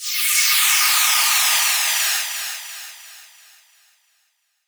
Fx 130, Techno; Loops; Ghosthack; Free Techno Sounds 2024

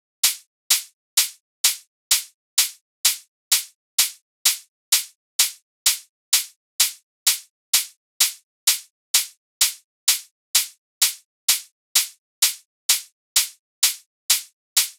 Drum Loop 128 BPM Open Hat, House; Loop Stems; Dogs

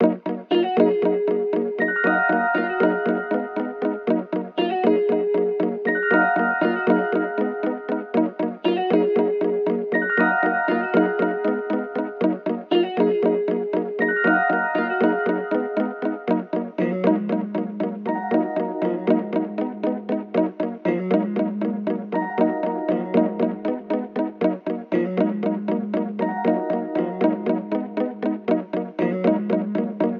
118 BPM D# Min, Melody; Cymatics; 2022 Melody Collection Platinum Expansion

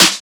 Snare, techno drum shot; Drum One-Shots; Ghosthack; Free Techno Drum Shots 2024

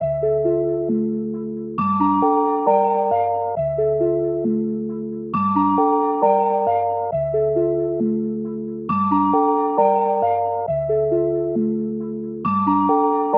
135 BPM D Min Keys; Melody; Loop Stems; Cymatics; 2022 Melody USB Expansion; Axis

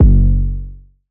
808 F#; Cymatics; Oracle Sample Pack; 808s

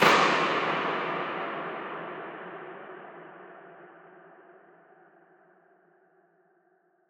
Noise Impact; Psytrance; FX

